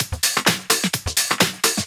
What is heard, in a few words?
Houseloops 128BPM; Vengeance Samples